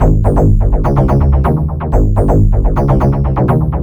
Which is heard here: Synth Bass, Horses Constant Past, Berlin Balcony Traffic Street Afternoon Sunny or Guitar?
Synth Bass